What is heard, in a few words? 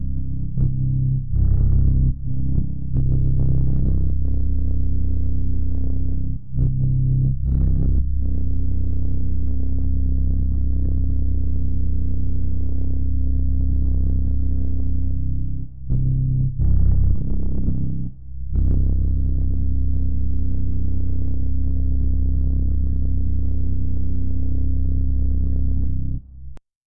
tense piano pulsing bass